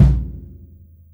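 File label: Jazz kit bass drum, Drums Acoustic; AKAI; JAZZKIT 2; 90s sample CD; USB Soundscan vol.10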